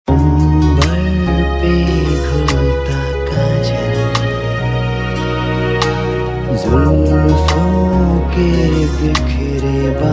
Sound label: Duet; TV Serial Tone; Life OK